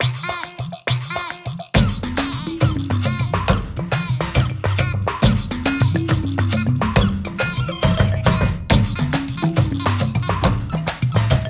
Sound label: Chirp!